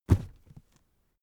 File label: cardboard box small lift handle; objekte